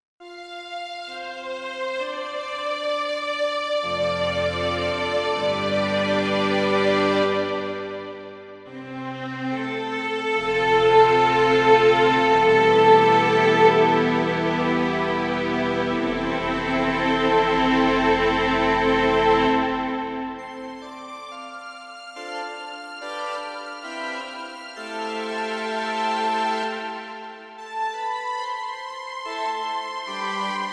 Electro 6 Pfeifenorgel und Streicher

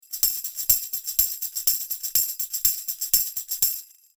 Rode TF5 Tambourin close

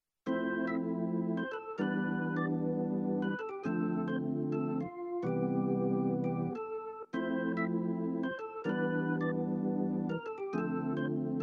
P255 Orgel

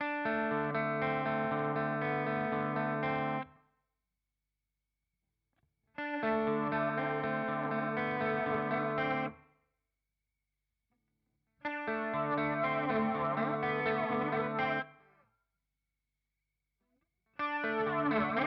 Walrus Audio Polychrome DFV Regler

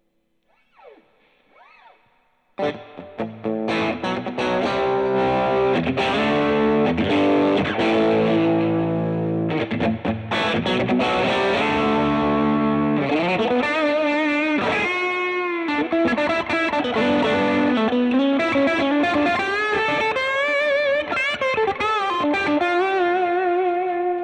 Fender Blues Tweed Dist Hall

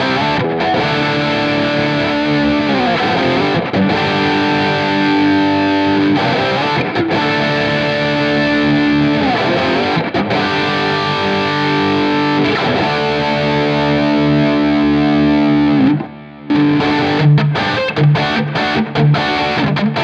Floorboards im Vergleich Highgain w Pedal OD Referenz; S-Gear Plug-in

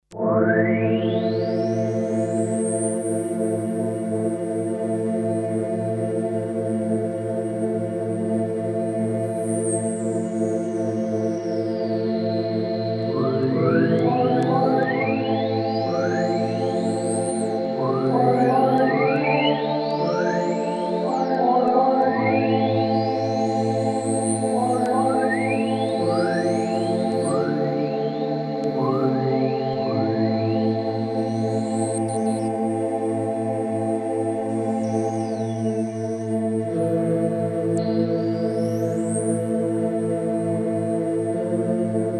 Chorpatch mit Filtersweeps und Sampling Artefakten

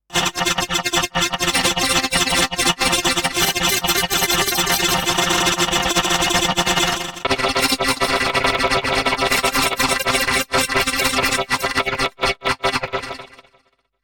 Granular synthese Four Voices Octaves; Emu EIV classic und Ultra

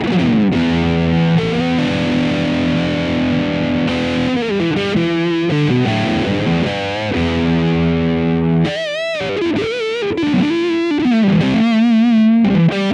Spaceman Sputnik III Toggle rechts alle Regler 12 Uhr

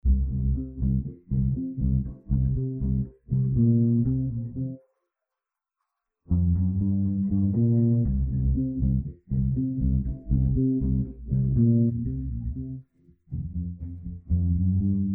song nur bass; steinberg spectral layers pro 8

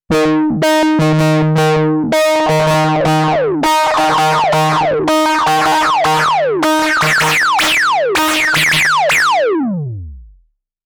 Resonanz FB10 4075

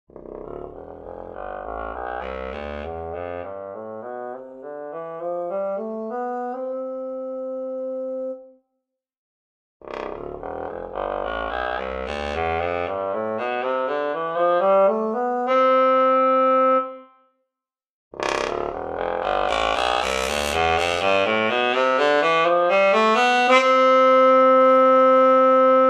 Bassposaune Cup

